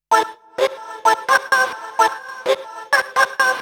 House Loops, Vocal Loops House 128BPM

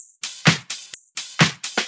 House loops 128BPM; Vengeance Samples

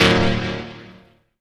Synths D#; Vengeance Samples

